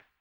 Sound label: HAMBONE; ILIO; Vocal Planet VOL-2; Blues & Soul